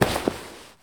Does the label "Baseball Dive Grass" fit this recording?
Yes